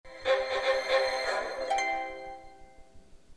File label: chivalry, zvuki telefona blackberry bold 9700